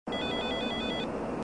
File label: alert